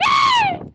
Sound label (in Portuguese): grito cearence